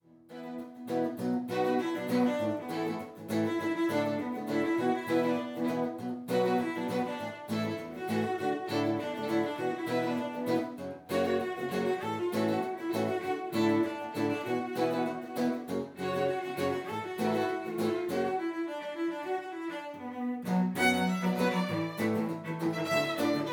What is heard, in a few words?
string quartet; wedding ceremony reception music